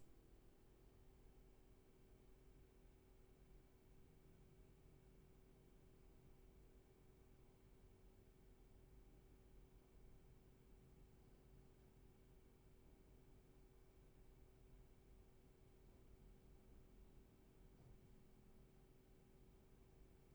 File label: Room tone